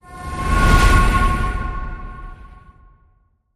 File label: Attack Whoosh; Raiders